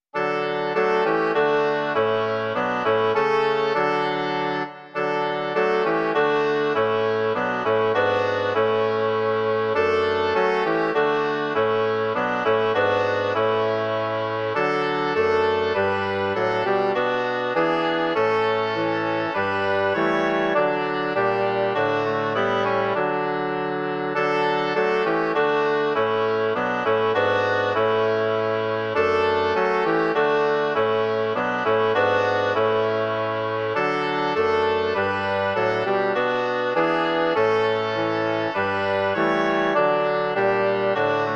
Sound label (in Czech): 2 hoboje a 2 fagoty; Skloň se k nám svou milostí; Imaginativní verze